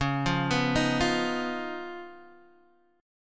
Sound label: Db 9th Db Guitar Open D